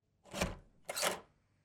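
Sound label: door handle sound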